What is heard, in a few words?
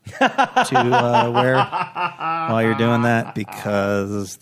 awesome laugh story